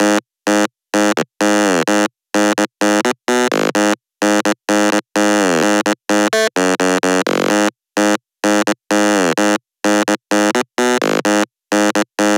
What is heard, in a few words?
128BPM Bass Dry noSC, Root G#min; Demo Constr Kits; Break the Chains; Bassline